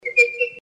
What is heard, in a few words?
correct; STAR WARS